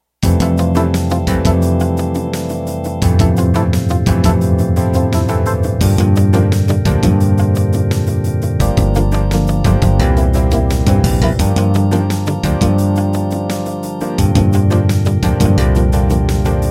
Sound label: 16beat